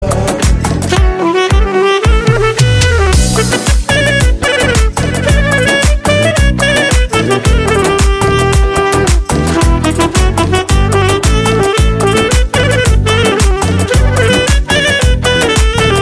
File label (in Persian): zang